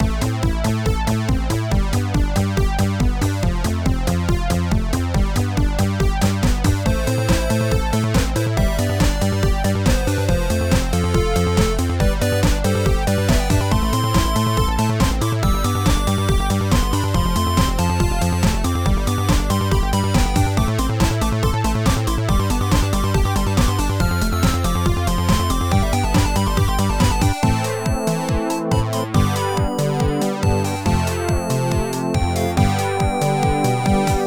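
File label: background music minigame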